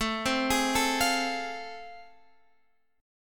A Minor Major 7th Sharp 5th, Guitar Half Step Down; AmM7#5